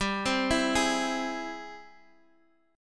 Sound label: G sharp Augmented Major 7th G; G#+M7/G arpeggiated